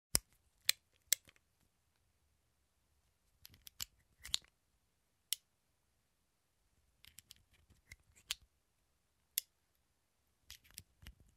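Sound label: loading a revolver hammer cylinder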